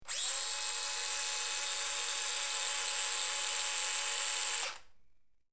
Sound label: High Pitched Drill, air tool